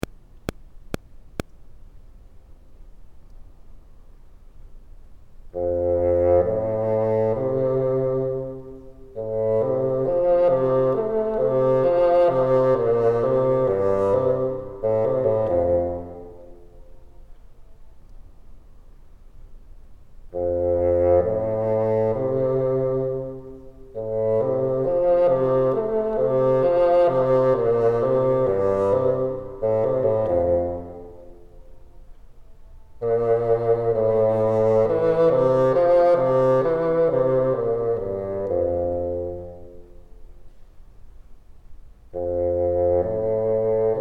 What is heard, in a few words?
bottom, bassoon; Bottom Part